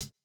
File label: Jrock hihat